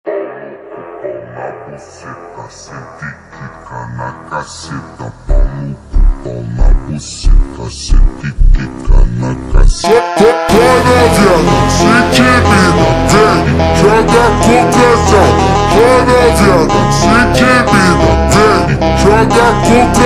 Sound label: Funk ultra Slowed